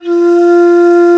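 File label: alto bansuri, World Winds; E-MU Producer Series Vol. 7 – Old World Instruments; World Combos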